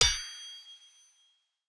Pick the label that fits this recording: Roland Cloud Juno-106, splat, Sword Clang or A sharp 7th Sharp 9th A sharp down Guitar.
Sword Clang